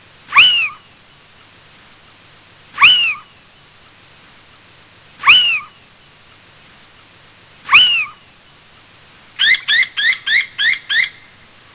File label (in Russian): domovoj sich athene noctua